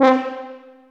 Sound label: TUBA, Solo Brass, Tuba stacc; Roland LCDP12 Solo Brass; BRS Tuba